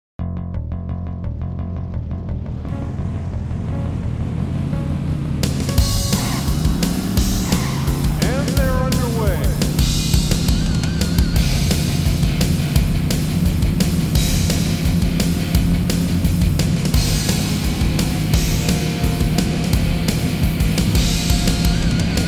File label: Crash and Burn; Daytona USA Championship Circuit Edition; 1996 Saturn gamerip